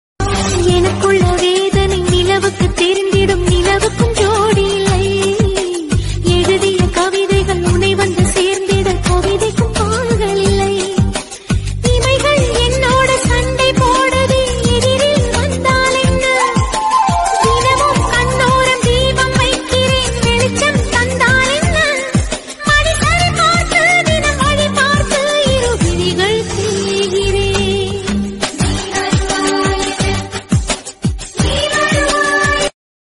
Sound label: female version tamil